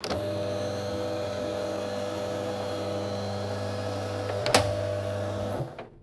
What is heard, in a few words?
motorized projector screen rolling down; motors